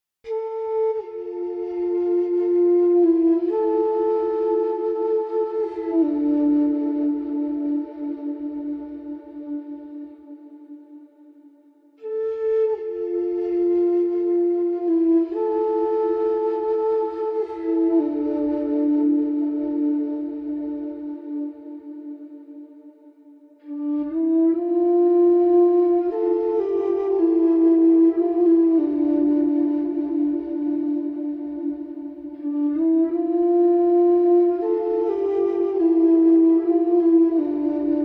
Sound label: flute; Elder Silence - Jubalflute